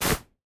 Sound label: Basketball Net Moving Swoosh Scoring; Fusehive